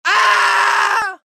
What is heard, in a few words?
scream, male; gmod; npc